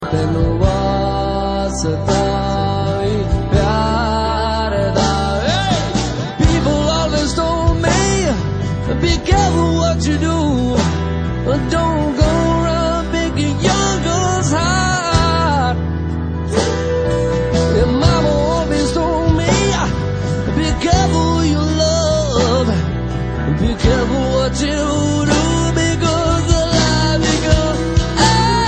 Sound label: song tone ringtone